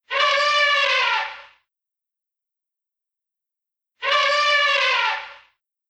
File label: Elephant Sound Effect Trumpet long